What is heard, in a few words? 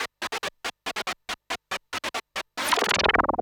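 synth electro 140 A; keys; Techno2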